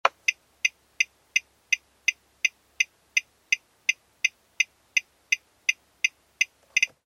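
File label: car turn signal sounds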